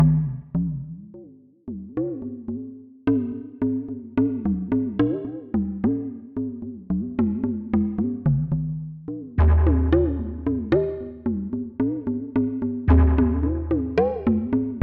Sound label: Cave Drum